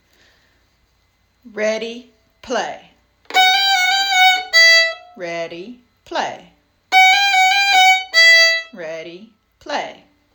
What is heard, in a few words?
Gavotte trill; Gavotte from mignon